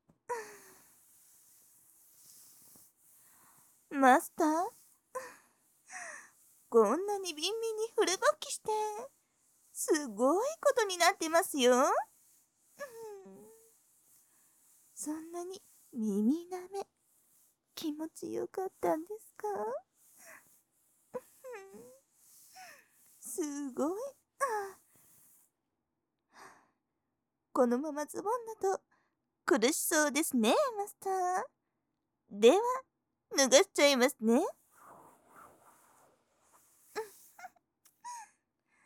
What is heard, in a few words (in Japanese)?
耳舐め, 舐め; リリスちゃん; 通常・バイノーラルコース